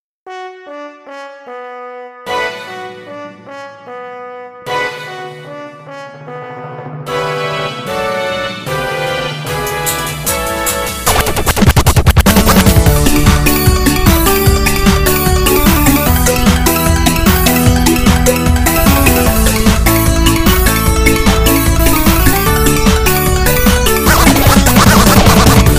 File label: piano